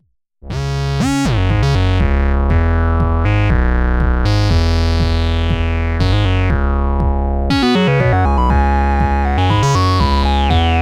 Novation Circuit Mono Station; Factory Session

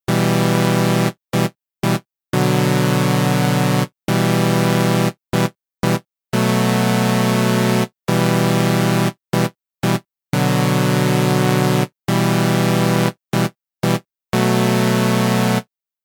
Keys Hybrid dry

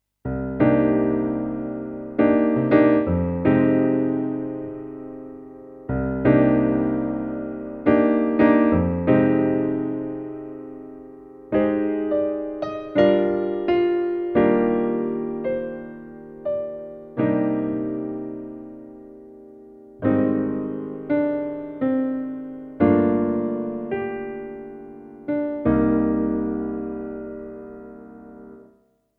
Thomann AK X1100 German Grand Piano

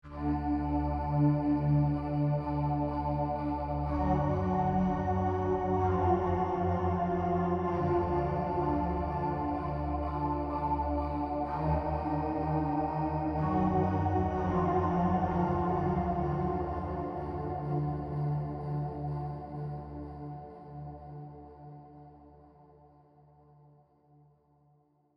Stylophone Sampler Seq Padsound

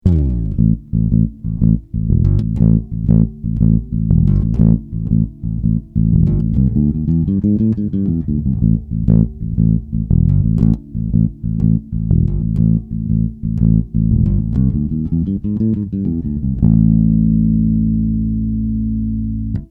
Flat Finger

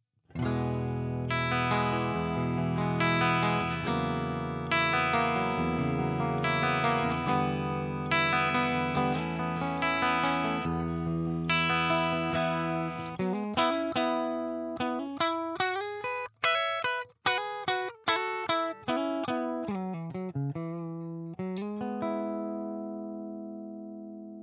Harley Benton TE 90FLT bridge CLEAN

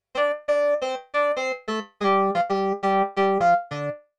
Moog Lead; PSP ECHO St

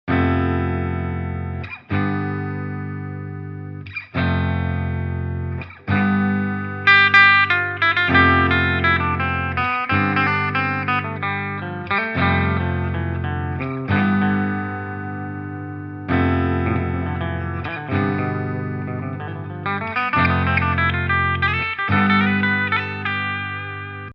Loop; BOSS RC-5

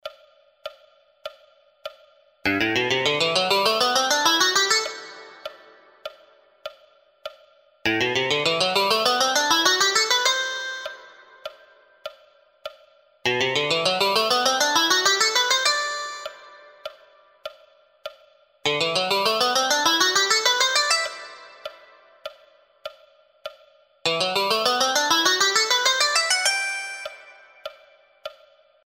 SCALES CAGED Boxes

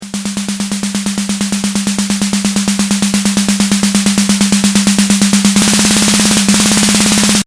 Snare

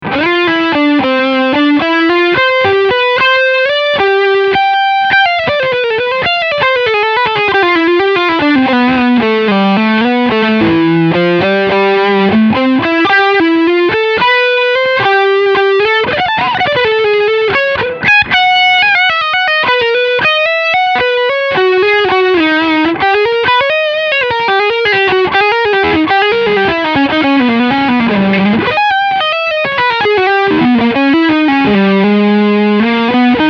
AMP Boost Overdrive II

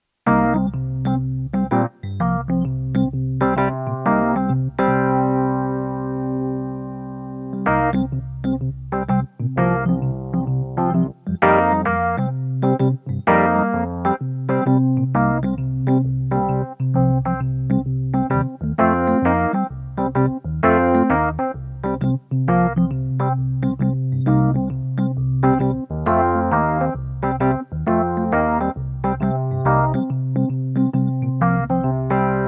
E Piano; Dimension D Preset 2

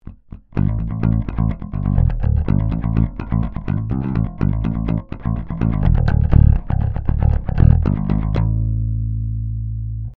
Ibanez EHB1505 Neck Bridge Pick Passive

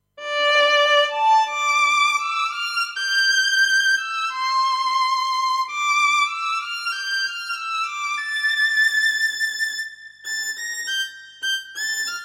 Solo 1st Violin

